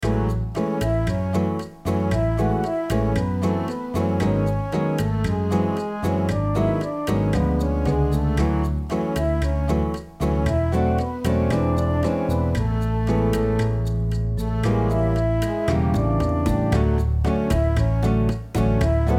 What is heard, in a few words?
Bossa Record